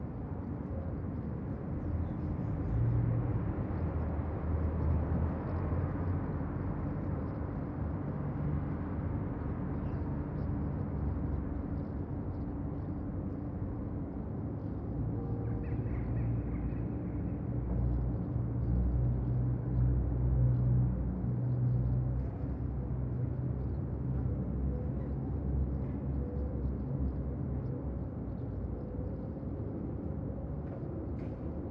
outside Noise; PC Building Simulator; PS4

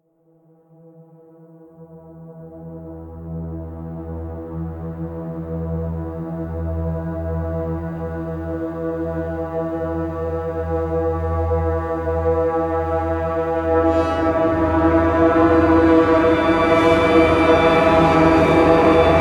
Ambient Tension; kholat gamerip 2015

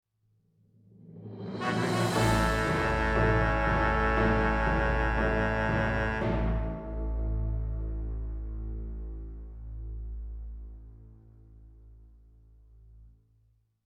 Tada; full throttle remastered ios linux macos ps vita ps4 windows xbox one gamerip 2017

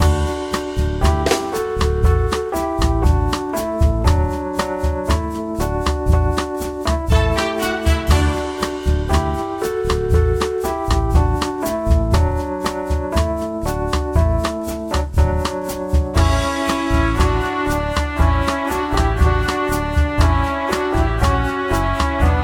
Beat; Peggle Beat 12

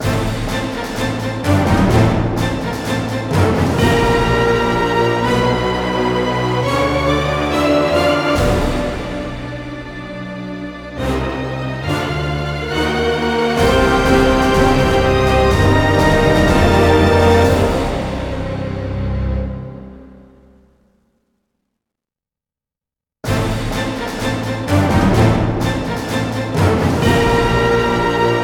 Score Music; super meat boy 2015 ps vita

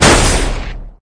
Vehicles; unreal development kit; Manta

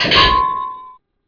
Metal Impact; knights try

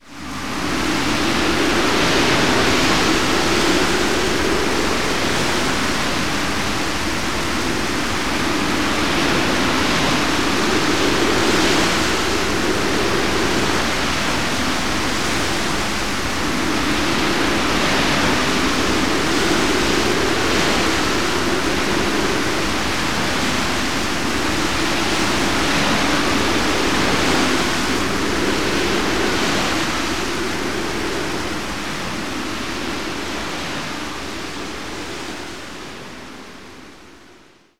Strong Wind

